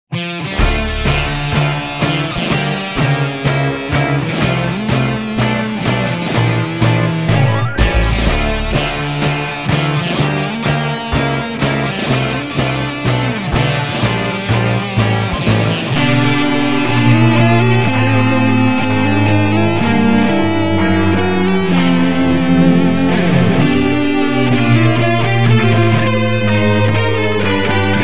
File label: Battle, guitar; shining guitar